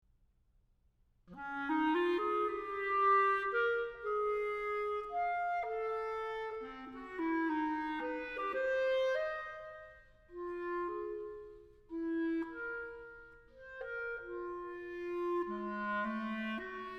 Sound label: sonata for clarinet, movement; sonata no 1 for clarinet iii movement; naxos